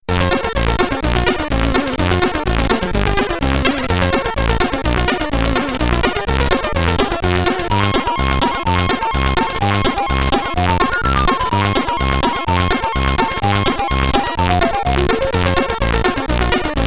Instructions Page, SID Stereo, commodore 64; fruitbank

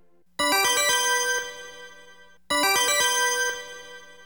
Coin (Coin Sound); Gradius 2; Space Odyssey

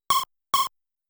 Spell Reflection; The Tower of Druaga

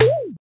SFX - Enemy Kill; donovanni the vanni ver .4.2 2021

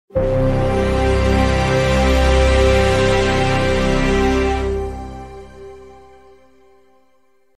Stinger Boss Defeated; The Surge

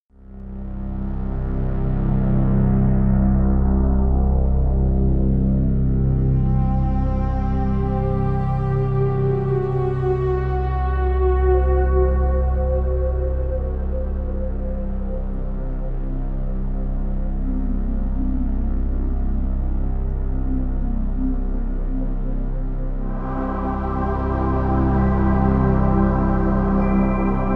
Dark Ambience; X3 Gold Edition

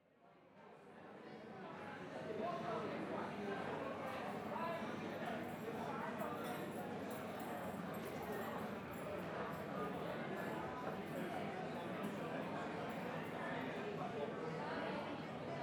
Ambience Stadium; gamerip; Dream Daddy A Dad Dating Simulator

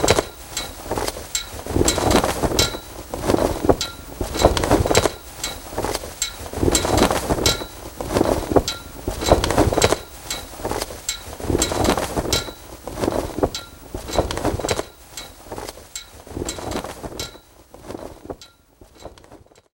Amb Generic; Dirt 4

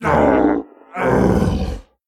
Stagger; The Town

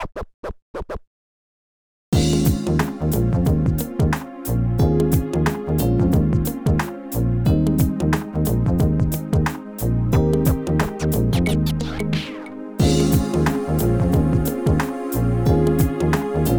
Hip-Hop Stage Karaoke; Pinky Street Kira Kira Music Hour Pop Princess; Hip-Hop Stage 2

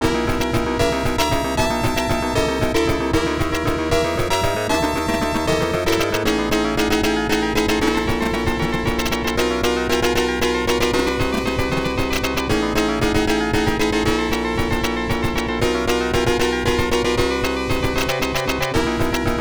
Battle Phase 3

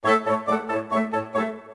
BGM Menu Intro

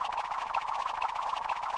Propeller hat Rainy Loop

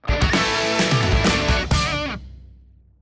Small Celebration; Disney Infinity

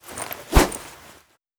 shadow grenade throw

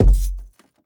Footsteps Wood Walk; The Town

